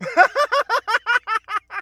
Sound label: happiness